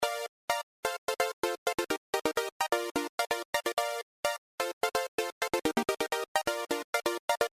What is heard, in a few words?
BackBeat